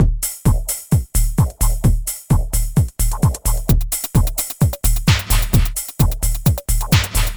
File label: Beat Trasher; VariSaturator